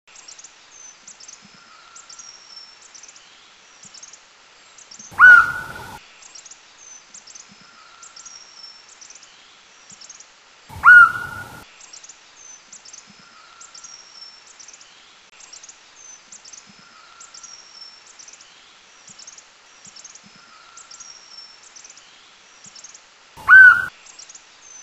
Red-collared Woodpecker, Laos

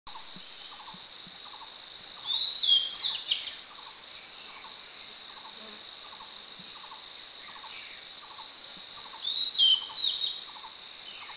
Oriental Magpie Robin; Mishmi; India

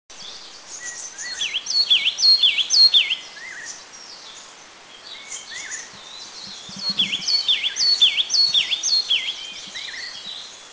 Yellow-cheeked Tit, Eaglenest, India

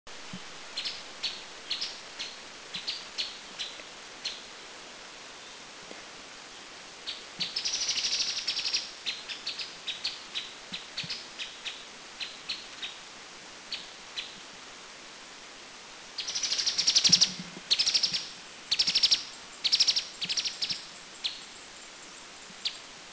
Streaked Spiderhunter; Thailand

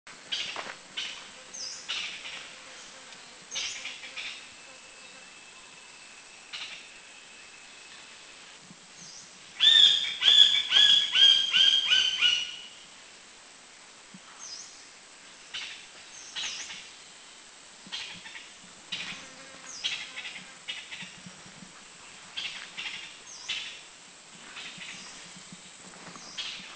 Bay Woodpecker, Thailand; Kaeng Krachan

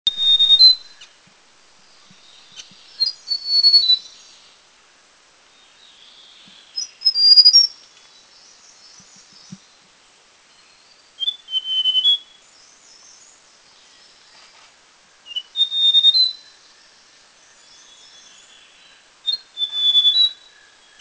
Mountain Tailorbird; Mishmi; India

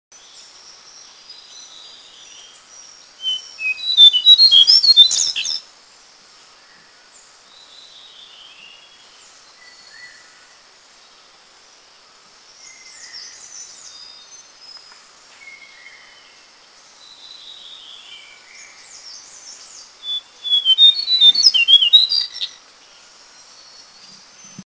Lesser Shortwing; Eaglenest; India

